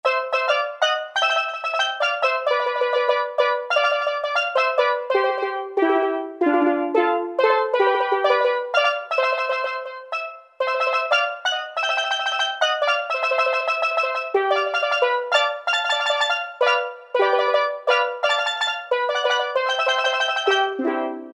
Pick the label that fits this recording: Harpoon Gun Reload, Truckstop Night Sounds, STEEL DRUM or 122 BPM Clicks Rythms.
STEEL DRUM